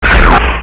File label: biorifle mag in; weapons